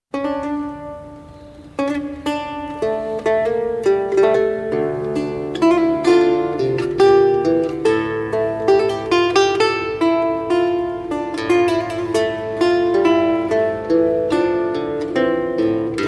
Laud

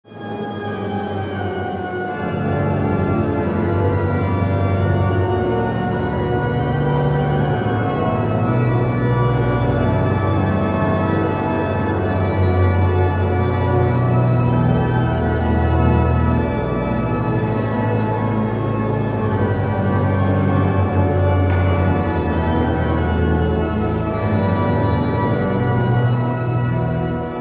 órgano

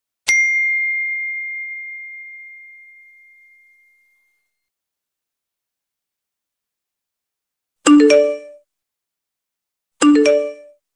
sonido de notificacion de iphone

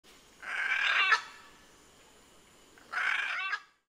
Guacamayo Macao o Guacamaya Macao Cantando Sonido para Llamar

